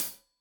Hat Tight, Deeply Sampled Drums Cymbals Stereo Hi-Hat